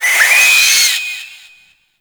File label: Infested Face Hugger Latch Start; Warframe